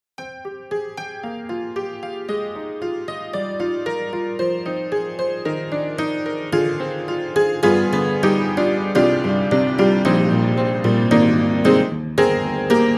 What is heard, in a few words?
Upright Pianos Barroom Upright Barrelhouse Piano; Synthogy; Ivory II